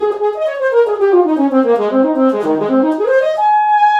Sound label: sax; blocks neural instrument coding